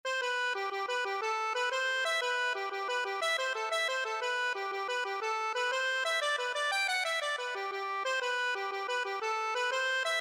accordion

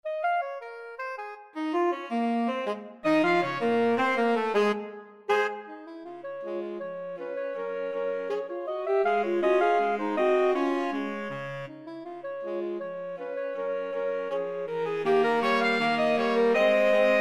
the entertainer SAX quartet

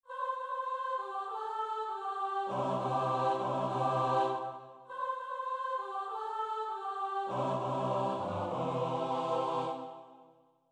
choir

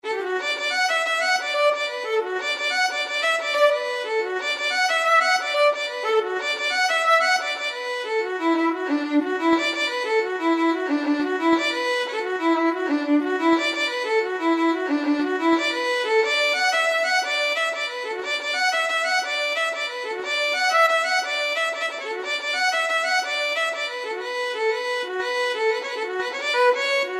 violin; allistrum

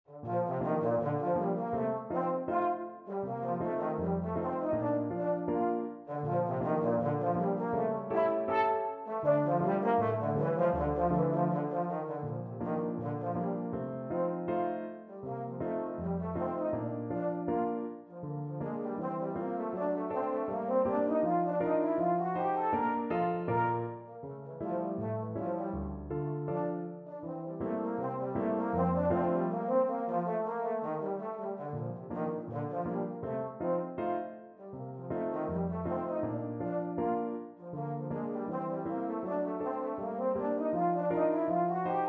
pizzicati, euphonium

